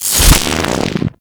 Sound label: Infested Arc Rifle Fire Stop; Weapons